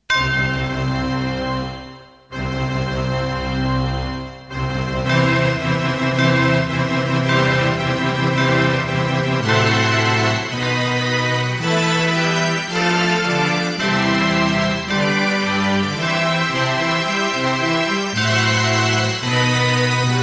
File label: wedding music